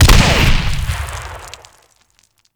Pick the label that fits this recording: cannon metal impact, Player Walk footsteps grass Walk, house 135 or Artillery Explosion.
Artillery Explosion